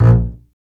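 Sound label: strings bass, contrabass marcato; Roland L-CD702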